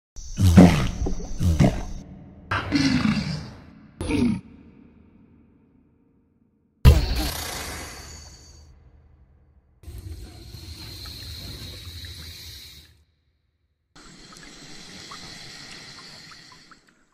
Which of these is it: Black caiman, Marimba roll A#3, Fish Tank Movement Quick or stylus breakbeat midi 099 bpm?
Black caiman